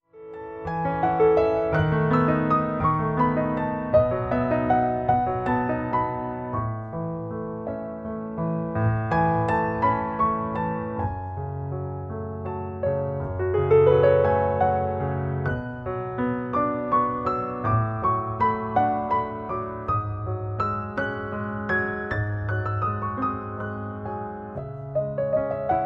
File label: Lyrical mood